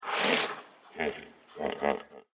water

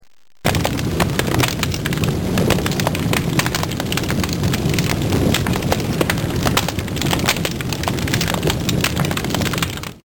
Fire

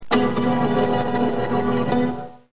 piano

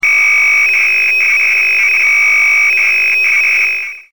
fax modem

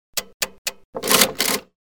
add machine

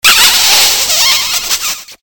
kiss

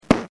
SQUISH

